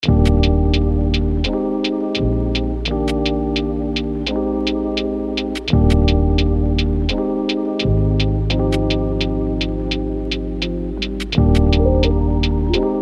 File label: Lofi Melody Loop Dmaj 85 BPM